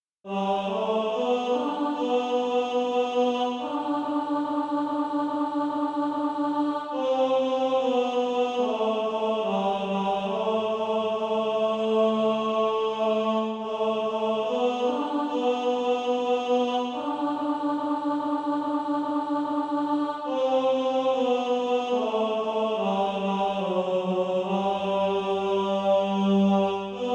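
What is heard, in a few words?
tenor, SATB Practise Music; Christ Is Born; Highfield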